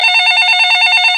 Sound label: loud ring